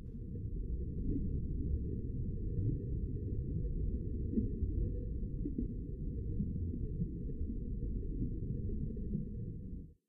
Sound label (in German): rsound tiefsee; minecraft